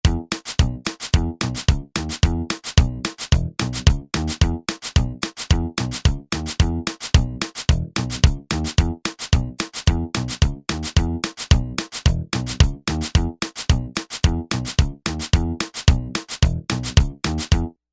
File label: Country